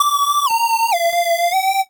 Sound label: Beat